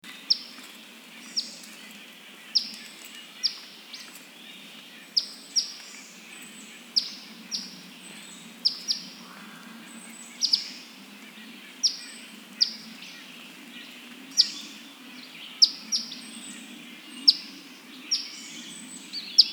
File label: Eastern Phoebe, Sayornis phoebe; flycatchers